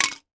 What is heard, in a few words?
mince pie or apple pie style cake tin drop on table